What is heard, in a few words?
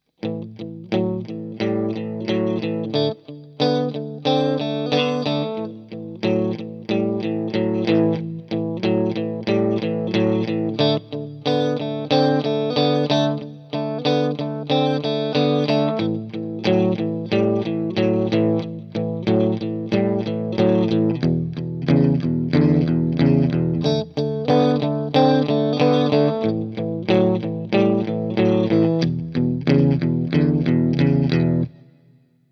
12 Bar Riff, guitar